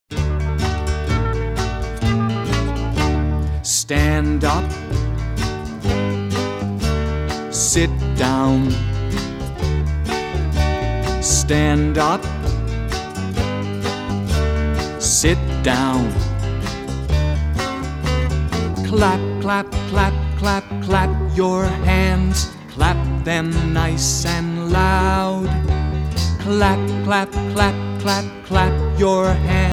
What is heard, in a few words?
standup sitdown